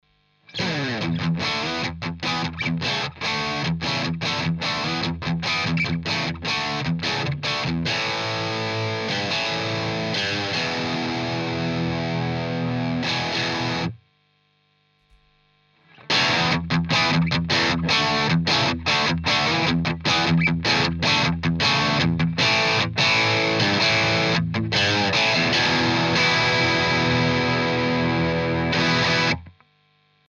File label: Screamer CH1 9 Tone 3 Gain Riff; JHS